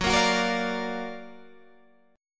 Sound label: Gb Augmented Major 7th Gb down Guitar Open G